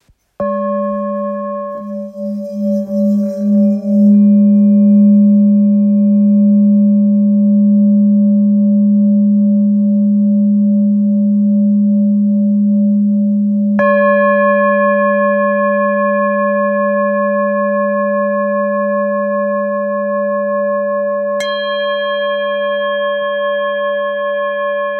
Bol ancien re

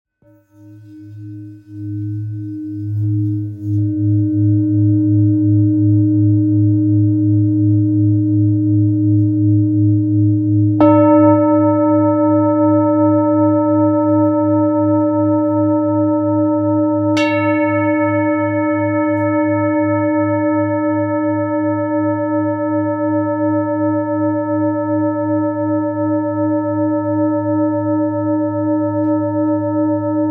Bol devjam 2.25kg re diese

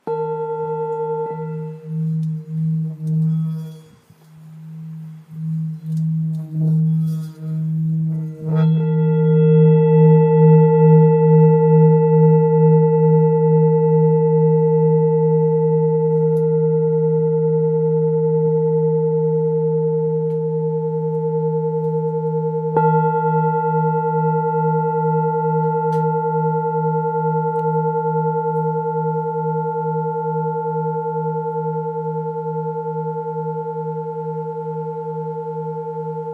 Bol bengale 1-1 1kg Redieze

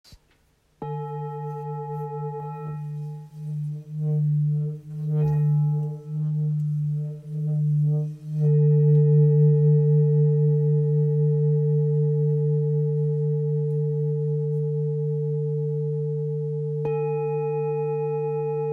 Bol full moon grave re 1.355kg

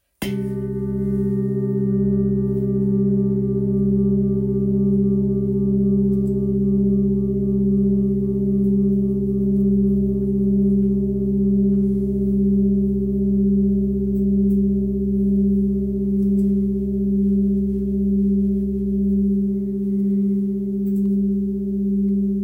Gros bol grave do 8.87kg chakras alignes; Bol chantant gros volume 4kg et +

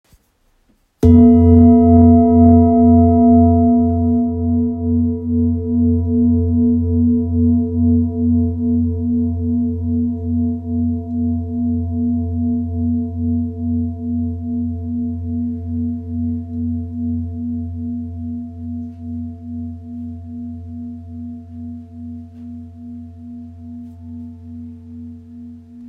Bol ramgandi fa

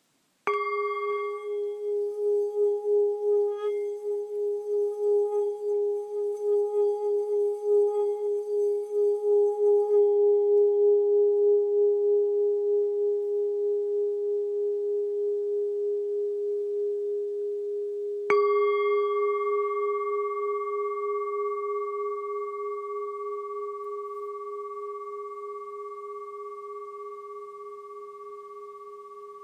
Bol bengale do dièse

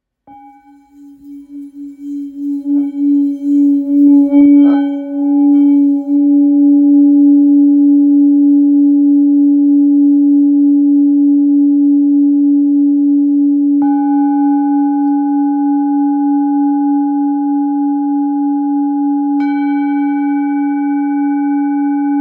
Bol full moon 1.445kg do dièse

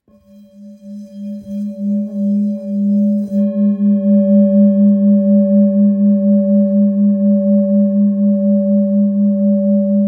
Bol ancien sol

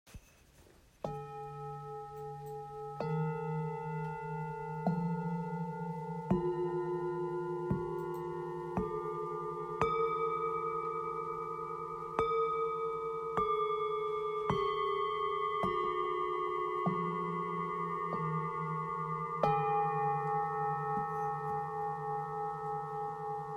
Set 7 bols ocean

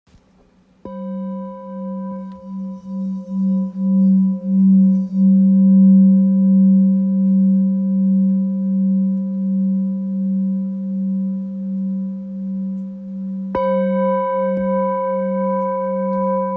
Bol ancien sol

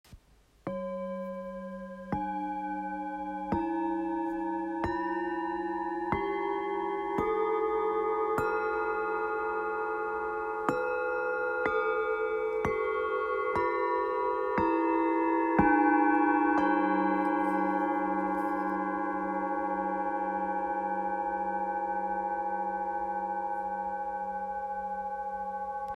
mystere bengale

